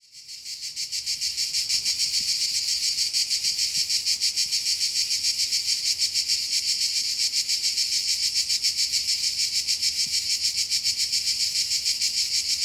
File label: Cicadas Castelvecchio Di Rocca, Liguria, Italy